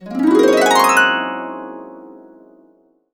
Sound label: Complete Harps; USB Soundscan vol.62; AKAI